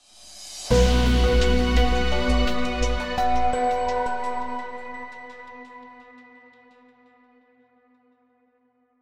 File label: fanfare solving; Opus Magnum